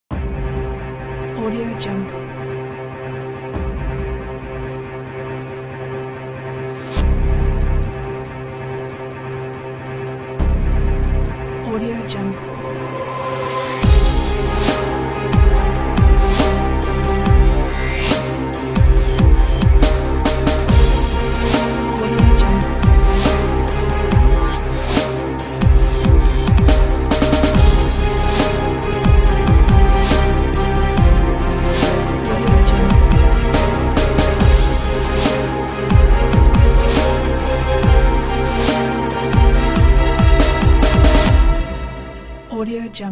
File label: dubstep violins